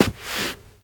Rug Turn; moul-assets

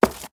Stone Run

